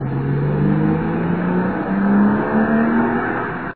motor gas; Grave Drive

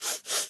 dog sniff; DuckShooter

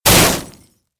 bump; Formula Racing

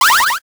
powerup; Jungle Treasure

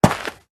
shoot sound; Soldier Way

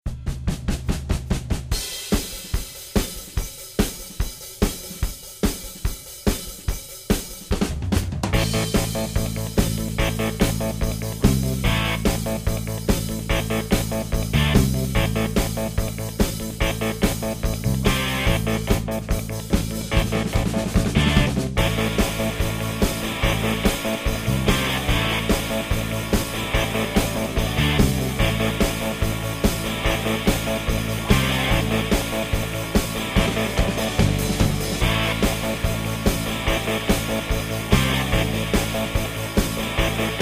race; Super8 Race

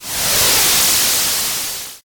ship fly; Season Land

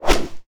sword swing; AlienWay

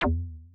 shot in shield; Alpha-E-Corp

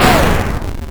sfx explosion; Space Shooter Alien

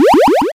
enter ship; Season Land

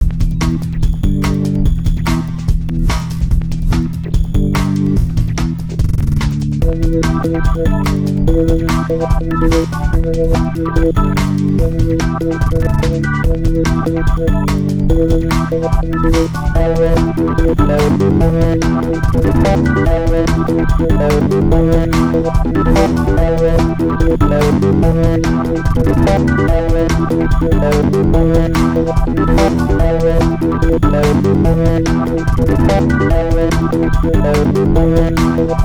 level; Wrecked

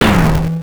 explosion; GalaxyofFire

